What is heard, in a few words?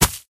Character Bounce; Angry Birds